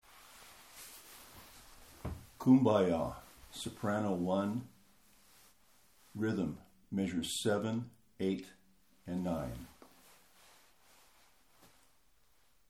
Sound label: Kumbaya Rythym Soprano